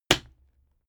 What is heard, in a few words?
fight impact sweetener solid